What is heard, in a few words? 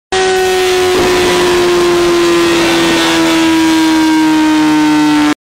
kawasaki ninja zx